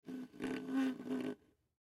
Straw Move Up Squeak, Foley Straw Move Up Squeak Cup Lid Drink Fountain Soda Pop Fast; Blastwave